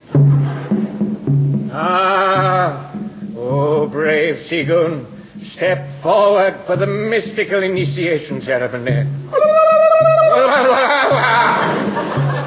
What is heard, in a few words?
piano